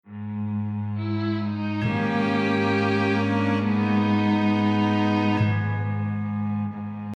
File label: Short Gothic